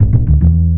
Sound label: bass bass check, Loops, Phonky